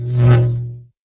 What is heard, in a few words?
Lightsaber; Oggy